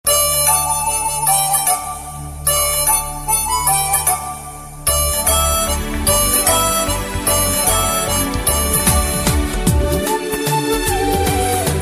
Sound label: romantic ringtone; classmates